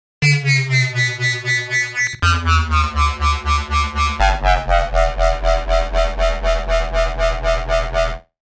Rapid Sync Hover; Parawave

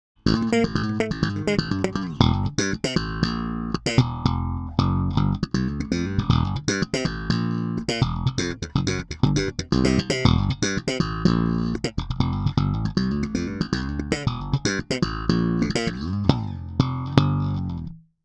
Savannah Asat Bass Slap

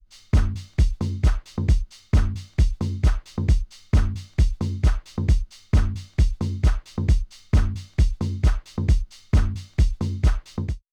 Reloop Concorde Green Loop

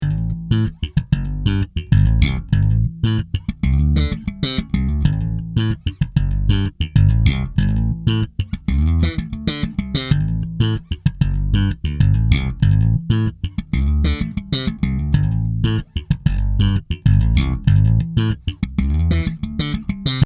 Bass; Two Notes Le Bass

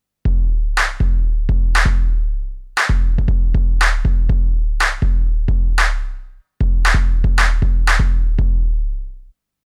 Roland TM 2 Claps

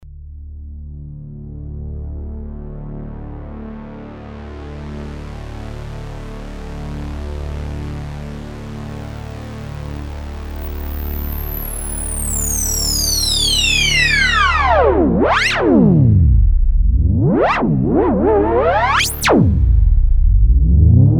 Lowpassfilter MS20

